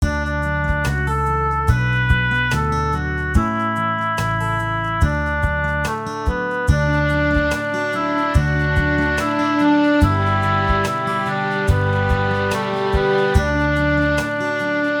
Loch Lomond violin and viola

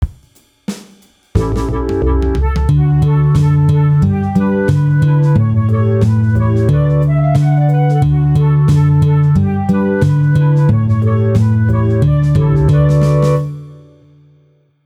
guitar performance

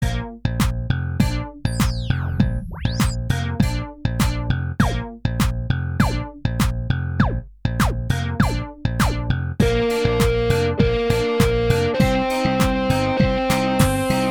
Practice Tempo B Flat

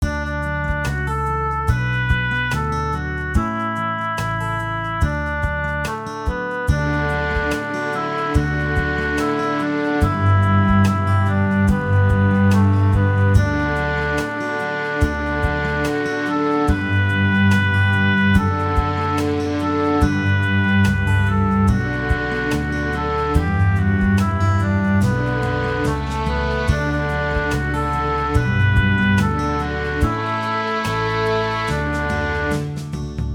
cello

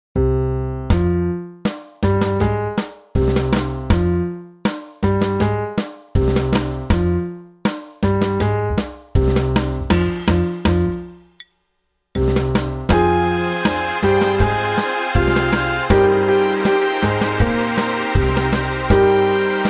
Tango Time Practice Tempo E Flat

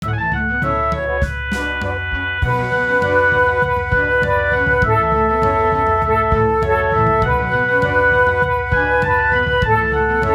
Drunken Sailor Flute Recorder

